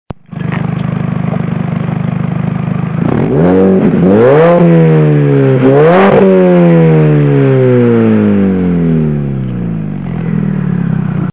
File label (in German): mini motor stand; mini cooper